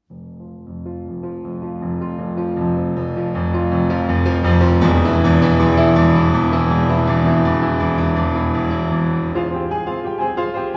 Piano Duet; La Belle et la Bête; I. Ouverture

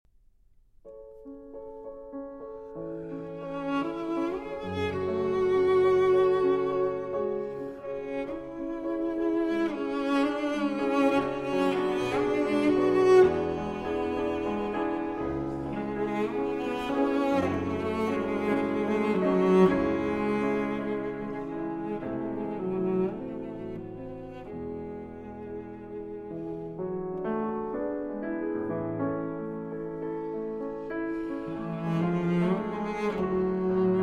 Romances, For Cello and Piano; 12 Romances, Op. 21 No. 7, How Fair This Spot; Arr. Sheku Kanneh-Mason

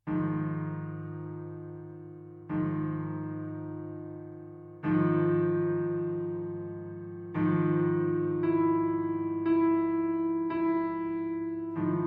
Piano Sonata, Sostenuto e Pesante

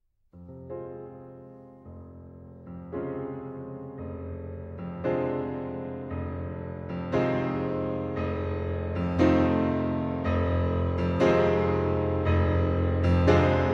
Piano Concerto in C Minor; Rachmaninoff; Op. 18

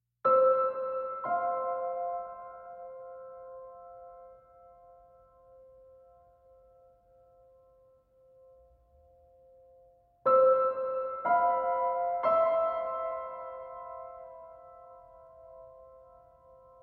Piano Only

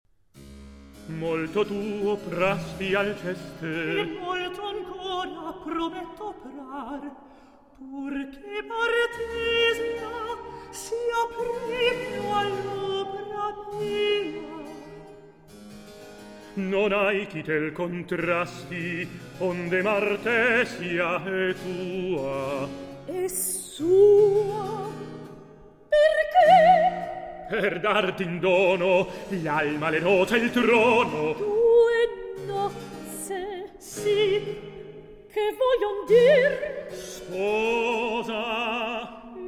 Recitativo; Ercole, Alceste, Martesia

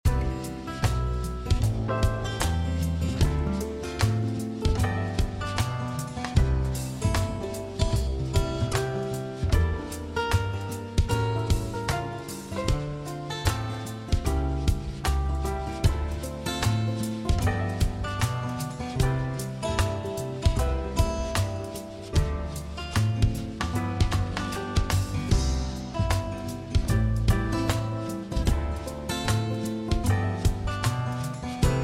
Jazz Mix Party

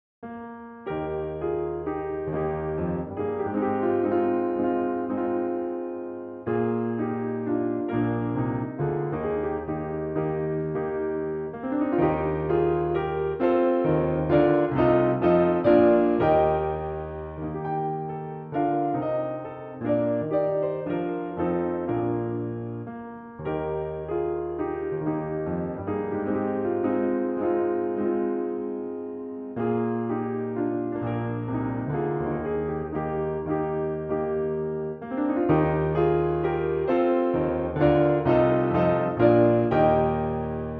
Minuet in E-Flat Major

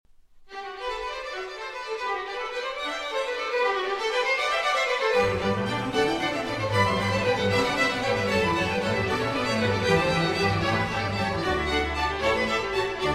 Concerto in G major, Allegro; Brandenburg Concerto No. 3; BWV 1048

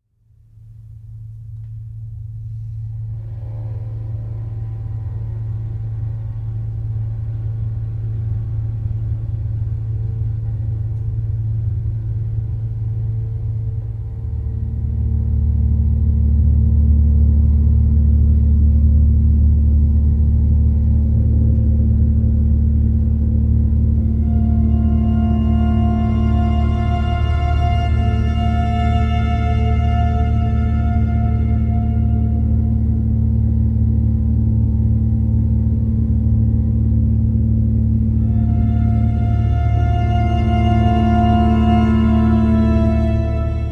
Drone

